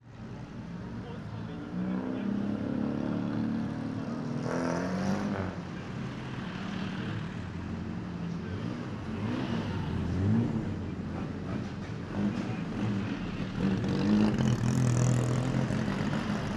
bugatti

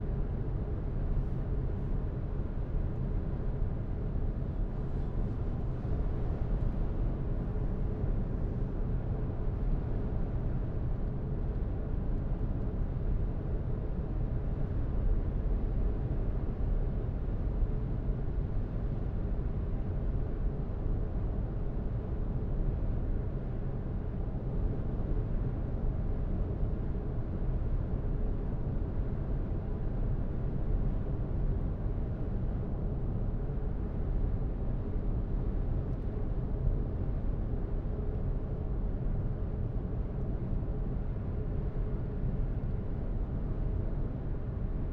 bmw

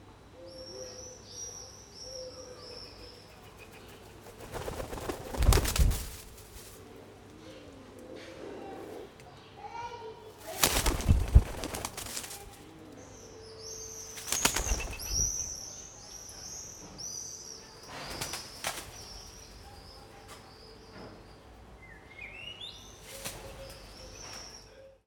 pigeon

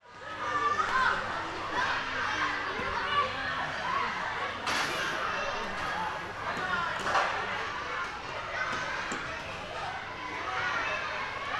tubi tuba

